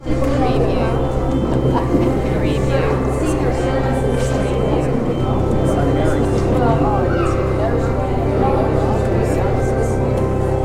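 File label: Church Sanctuary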